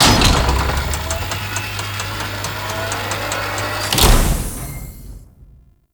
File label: Loot Container Open; Eidolon Plains